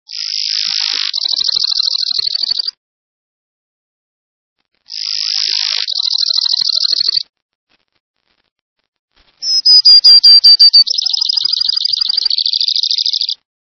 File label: Brewers Sparrow